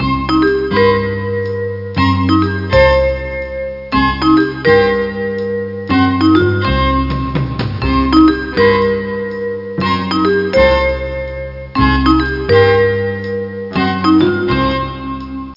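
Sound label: jazz loop; avr loop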